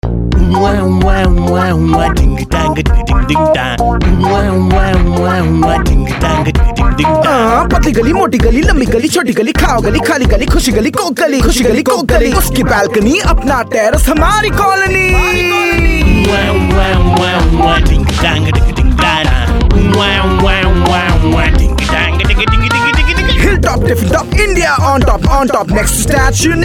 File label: rap, male voice